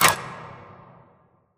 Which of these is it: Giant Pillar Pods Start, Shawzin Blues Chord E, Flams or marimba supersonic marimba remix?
Giant Pillar Pods Start